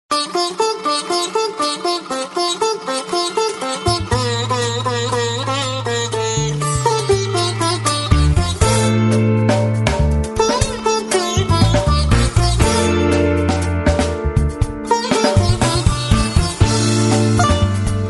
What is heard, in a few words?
sitar music call notification tone; iphone ringtones